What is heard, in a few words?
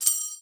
SHAKER, INDIAN PERC